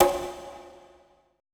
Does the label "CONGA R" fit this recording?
Yes